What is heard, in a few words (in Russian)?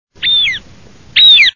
lun polevojj